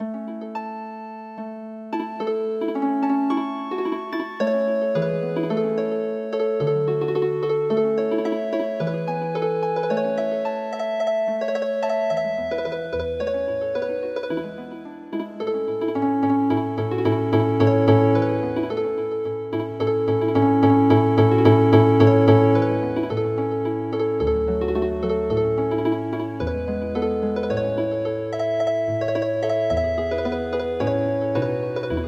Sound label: the rising sun Healing Harp